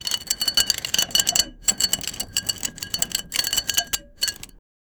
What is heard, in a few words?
light armor like clnk clnk, buckles